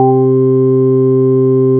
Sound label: EASY ORGAN; Zero-G; Funky Elements